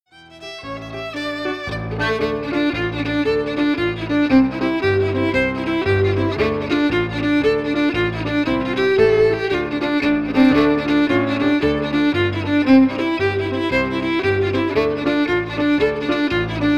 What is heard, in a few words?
Jigs